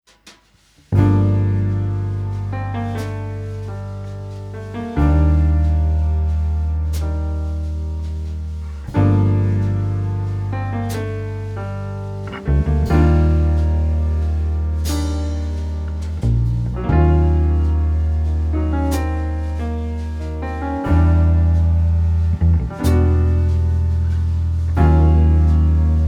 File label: Trumpet Arrange; pp pianissimo ayatsuri ningyou no rinbu original sound track tonality